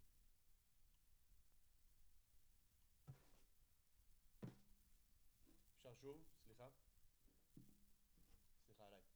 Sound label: Snare Top